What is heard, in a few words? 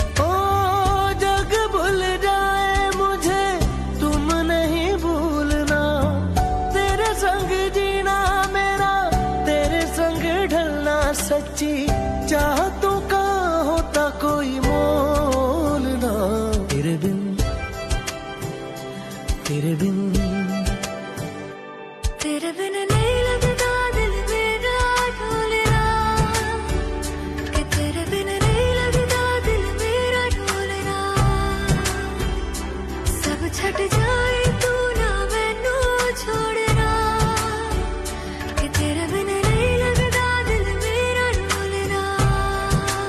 New Hindi Ringtone